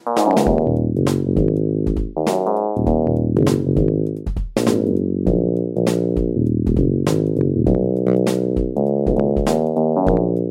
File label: Yamaha DX7 Velo Bass; Bassesland; Virtual Acoustic, Electric and Synth Bass KONTAKT Sample Library for NI KONTAKT; Syntheway